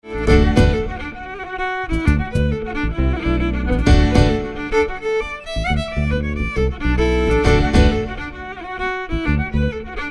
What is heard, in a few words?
irish waltzes